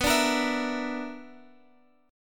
B Minor Major 7th Double Flat 5th B, Guitar, Open G